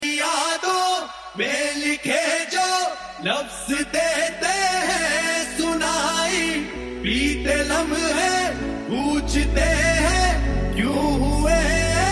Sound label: Ringtone